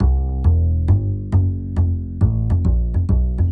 Walking Upright Bass

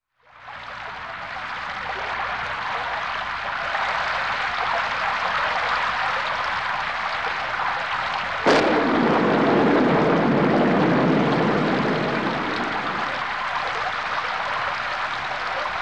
thunder and rain

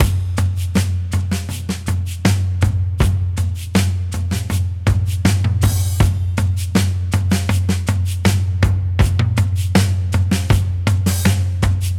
Full Drums; Guitar Hypnosis

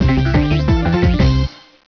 casino game

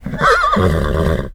Horse Whinny; animals

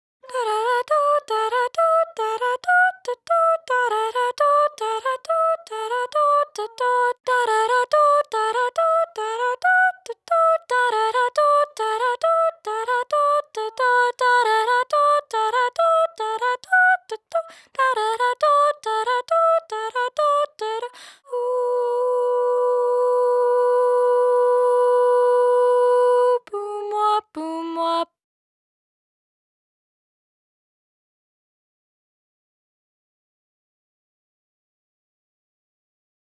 Soprano

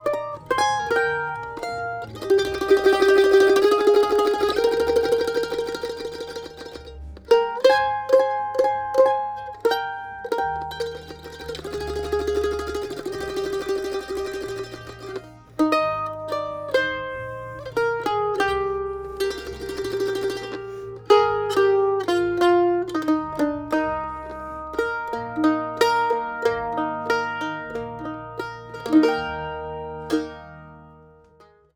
Mandolin

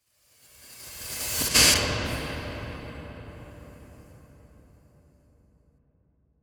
Pointillism SFX, Ambient Synth; Aerosol

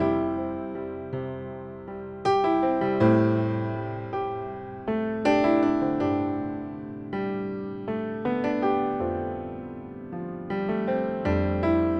Delicate Piano

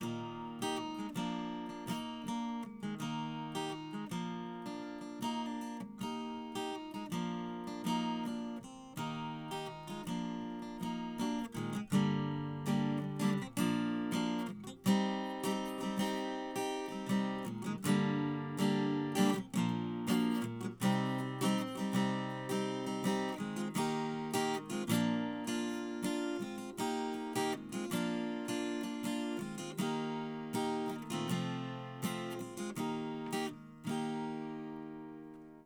Acoustic Gtr Mic

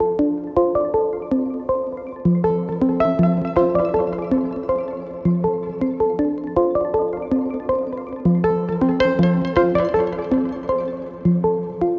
Rushing Feeling 80 F Synth, Ambient Synth Loops; Aerosol

